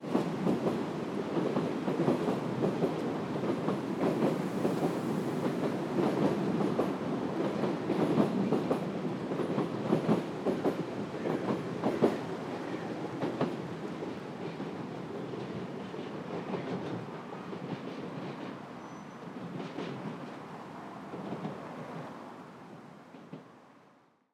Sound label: Train, city, Paris; lilesound